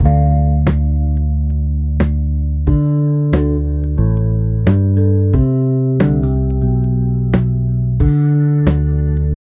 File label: loop 90 bpm